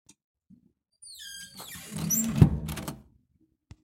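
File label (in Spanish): Puerta Cerrándose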